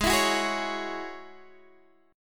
Ab 7th Suspended 4th Sharp 5th Ab down Guitar Open D